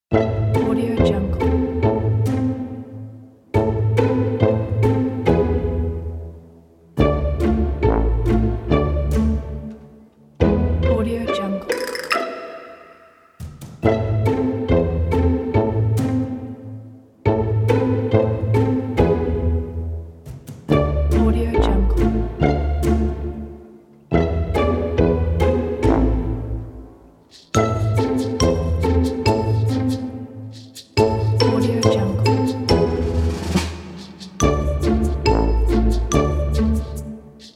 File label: awkward comedy; korolkov